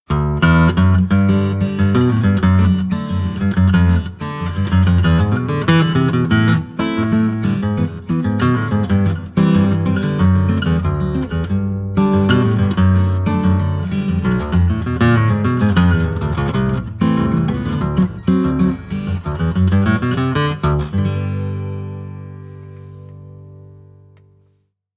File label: 7 cordes; 7 cordas de aço; luthier de São Paulo, Regis Bonilha